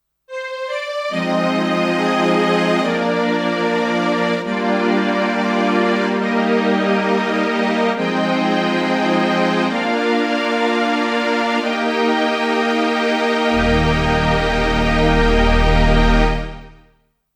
Studiologic Numa X GT Strings Ensemble

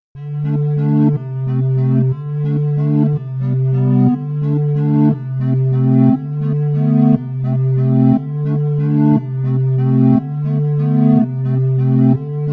Nord G2; Bumenkind

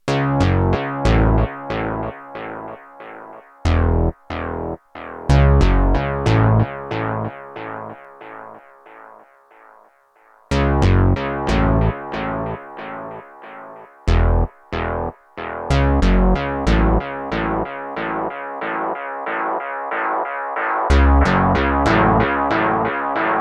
EHX Pico Rerun Synthesizer

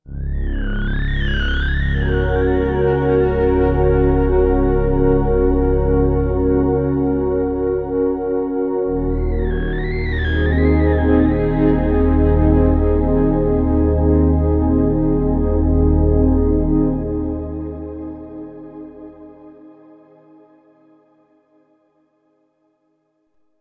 Pad; Ninas Beauty

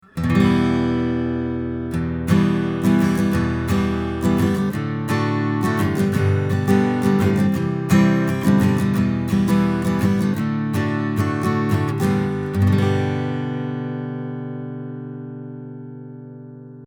Color Off Acoustic Git Schoeps CMC1MK4; Fredenstein Artistic EQ

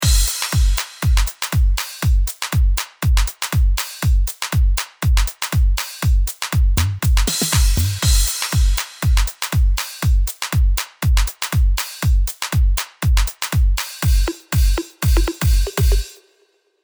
EQP KT Beat Dry

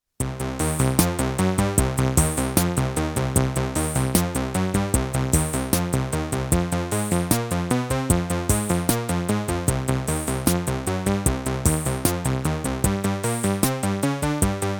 ARP1601 Boogie

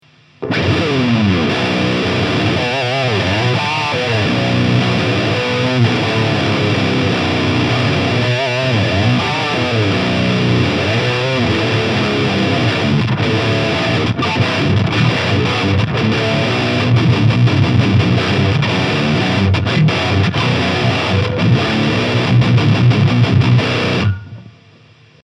Chapman Guitars ML1 Modern Baritone High Gain

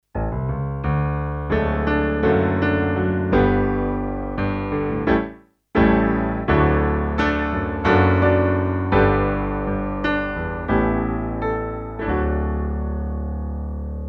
Alesis Prestige Artist Grand Piano

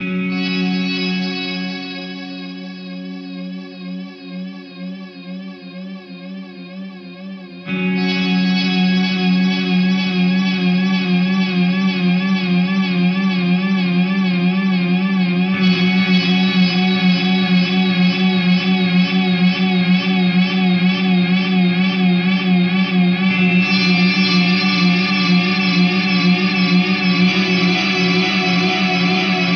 Delay Reverb Pedal; Minim

